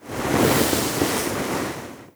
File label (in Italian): onde del mare, natura